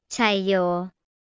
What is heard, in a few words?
cheers